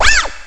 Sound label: Ferret